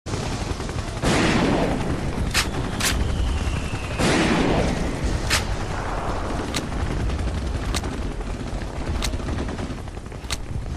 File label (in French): bruitage guerre; FLUVORE